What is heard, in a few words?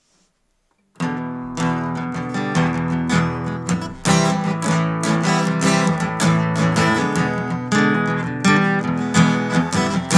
Dance Guitar Instrumental; hyper terrestrial; Circumference